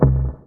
Single Acoustic Basses

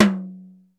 BEAT KITS, T; Crypton - DrumBase5000

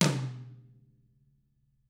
TAMA TOMR, Drums; ILIO; Double Platinum Drums 1

